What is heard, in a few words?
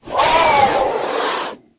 injured